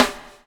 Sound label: BUBL, BUBBLE; Spectrasonics - Backbeat